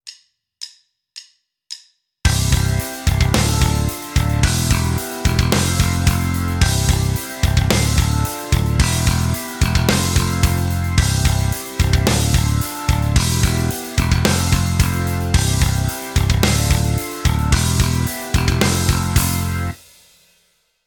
backing; Rockschool; Guitar Techniques 2019 Issue 297 July

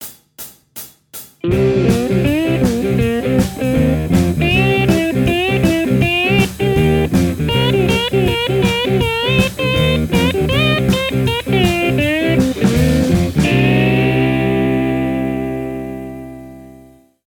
Slide; Guitar Techniques 2019 Issue 290 January

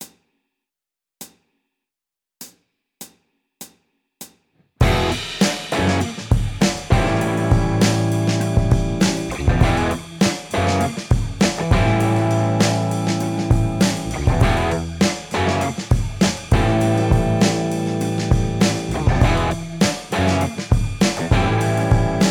Blues, Guitar Techniques; Guitar Techniques 2019 Issue 290 January

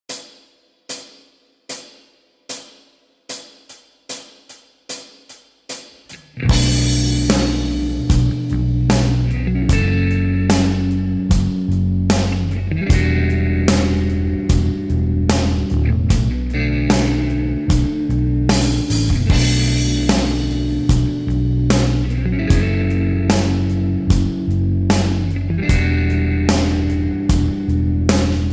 Blues backing; Guitar Techniques 2019 Issue 296 June

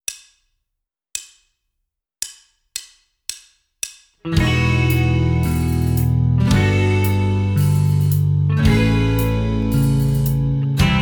Rhythm Guitar

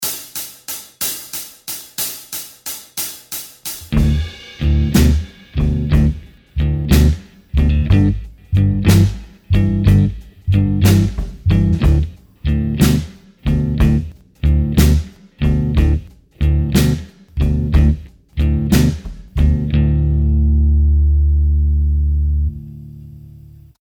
Slide backing; Guitar Techniques 2017 Issue 274 October

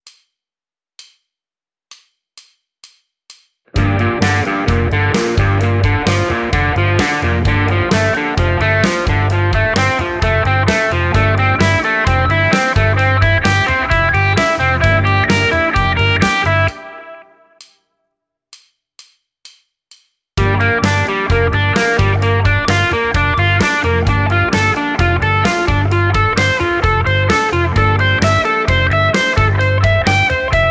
Blues Arpeggios - Gm13 Stacking; Guitar Techniques 2019 Issue 297 July

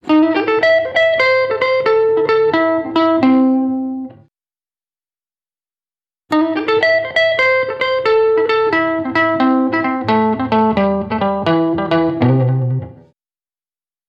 Jump Blues; Guitar Techniques 2019 Issue 296 June

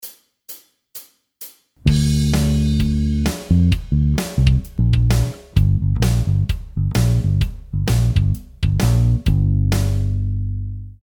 Slide backing, Guitar; Guitar Techniques 2017 Issue 272 August

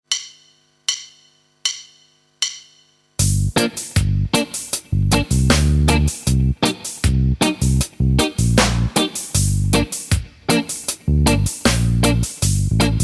Rhythm Chops guitar Backing; Guitar Techniques 2017 Issue 272 August

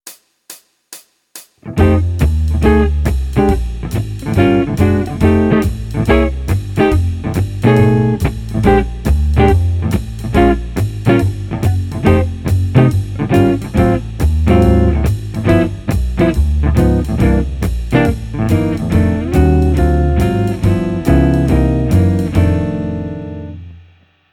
Jazz; Guitar Techniques 2019 Issue 296 June

